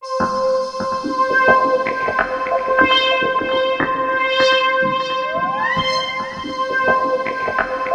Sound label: C5, SynthLine; 90 s Sample CDs; NiceBeats; SynthLine VOL-5